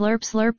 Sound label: slurp slurp